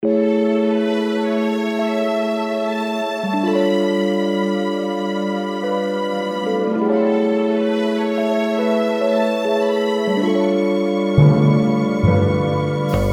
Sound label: duet